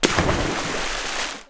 Sfx Splash Lg; Levels Hub Mansion

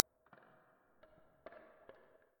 Running Puppet

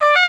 Trumpet Missed note; the princess and the frog; Bayou; Music Riverboat

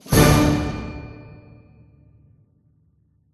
Heroic Mode Unlock

